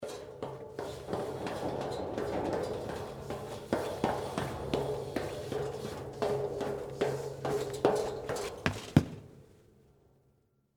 Spiral Stairs Down, Footsteps, Metal, Spiral, Staircase, Descend, Fast, Lighthouse